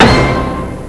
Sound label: orch hit; MusicStar; Reveal Computer Products; drumsnds